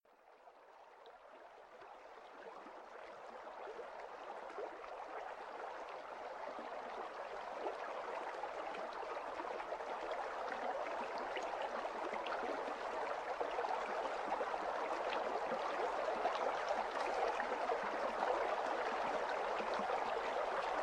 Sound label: relaxing nature sounds gentle water stream no music